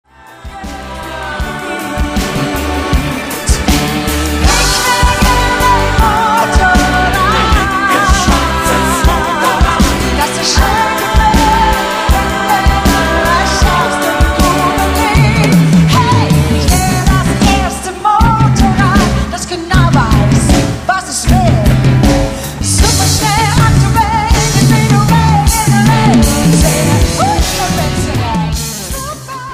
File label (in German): motorrad live version